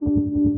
CHORD, Drum & Bass, 150 BPM; Ueberschall; Drum & Bass Resonance

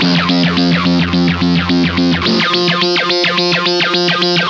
COPTER F 1, Funk Guitar; 90 s Sample CDs; Zero G

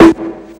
SN, S. DRUM, House Side Of 2 Step; USB Soundscan vol.51; AKAI

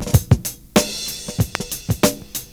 CYMB, Breakbeats

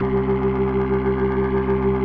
C2, B3

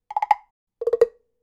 Temple Block, Latin Percussion

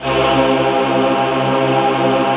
PAD, Dance Mega Synth

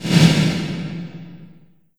LH S808 -R, REVRB TR 9+8 LARGEHALL808

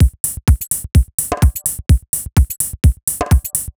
127bpm, House Drumloops

